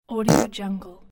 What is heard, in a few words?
fart